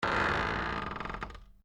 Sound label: Door Creak Wood, Foley, Door, Creak, Wood; Creaky Doors; BlastWave